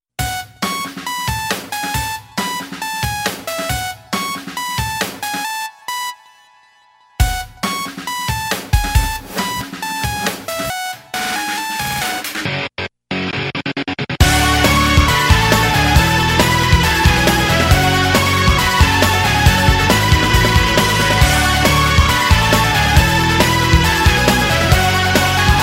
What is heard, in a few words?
wonderful ring